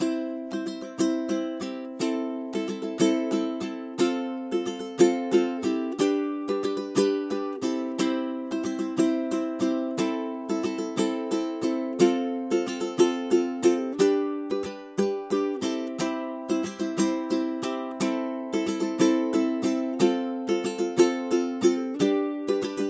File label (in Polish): bicie ukulele